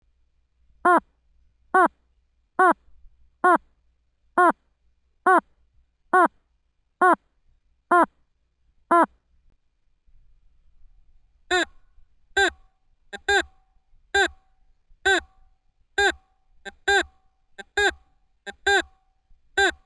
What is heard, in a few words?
lobo sound